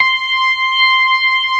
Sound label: STOPS, ORGAN; Keith Emerson Signature Series